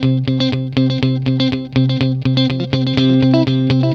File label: guitar, stringed instruments